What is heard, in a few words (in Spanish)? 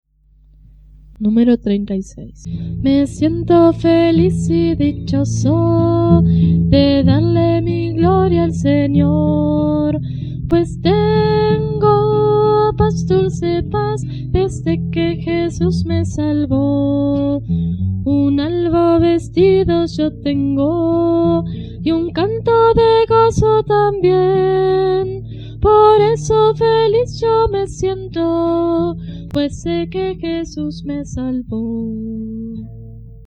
Coro, himnario niños